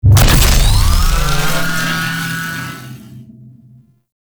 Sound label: Rail Jack Ship Power Ram Sled Arm; Warframe